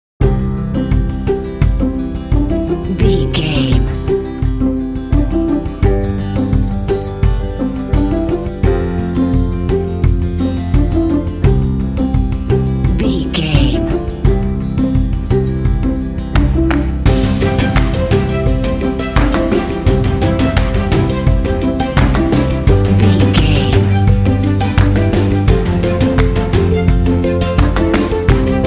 inspired cinematic folk

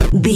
retro impact hit general

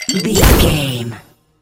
potions mixing alchemy

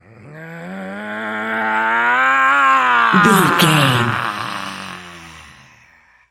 voice male growl

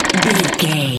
gun auto barrel spin slow stop

